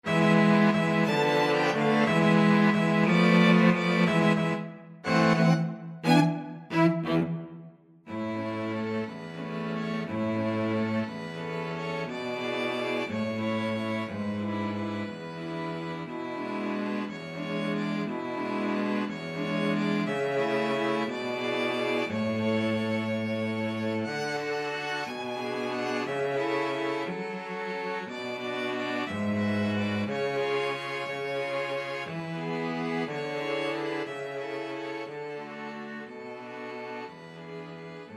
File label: waltz, string quartet; merry widow